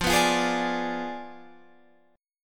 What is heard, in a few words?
F Diminished 7th F down Guitar Standard; Fdim7 strummed; JGuitar